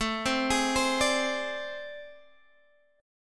A Minor Major 7th Double Flat 5th A Guitar Standard, chord; AmM7bb5 arpeggiated; 17,15,18,17,15,x